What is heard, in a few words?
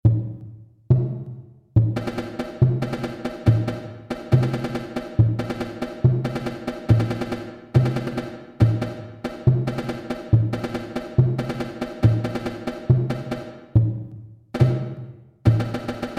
PIQUE SOLO 140